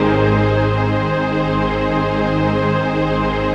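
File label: chord pad, Pads; Best Service; XX Large - Pads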